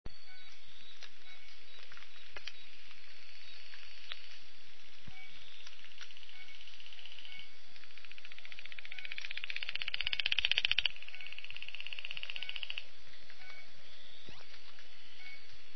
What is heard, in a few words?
Ischnocnema guentheri